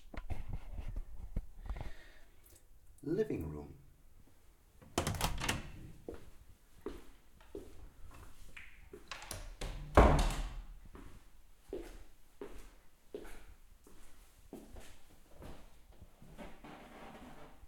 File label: livingroom walk through; Entering the living room through one door - hollow wood with glas panel - and walking around on the laminate floor.